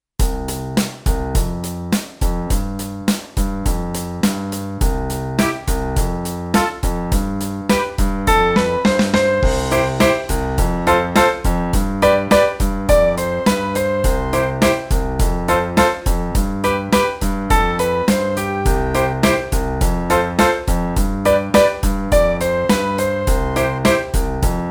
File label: Jurassic Rock